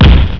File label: bomb; Assassin